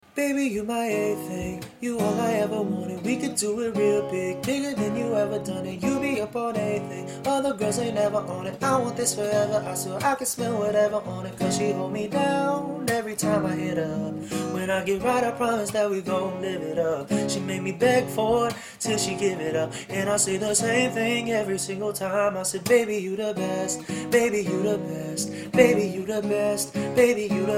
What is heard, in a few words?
acoustic cover, ringtone